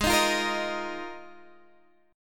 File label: Bb 7th Suspended 4th Ab